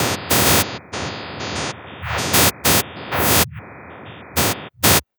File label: rhythmic spectral noise